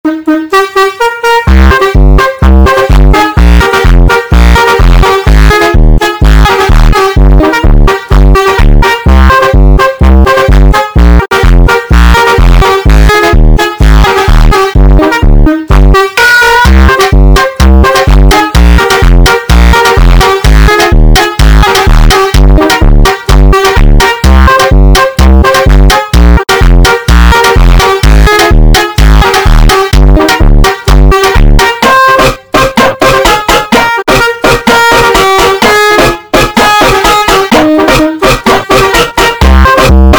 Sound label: Funk slowed